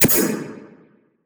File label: Sfx, Trance; Zero-G Trance Inducer; General Sfx